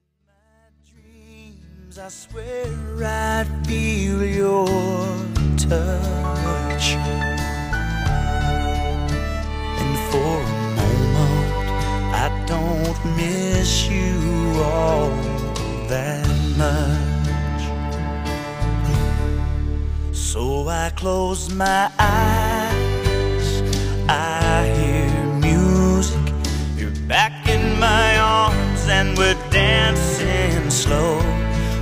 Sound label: brushes; Nashville Demo